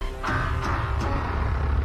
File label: roar; mechagodzilla 2021